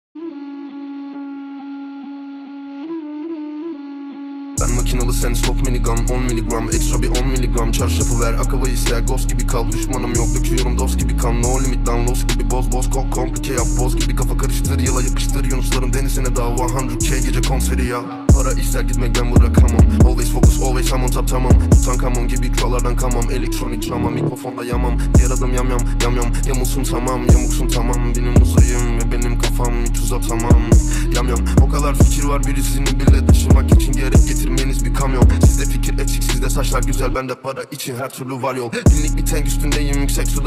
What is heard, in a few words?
drill remix